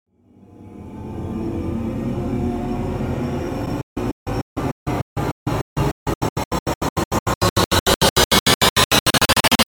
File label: Rise Only; Ninja Tracks